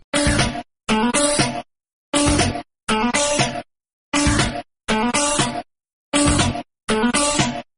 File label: sister guitar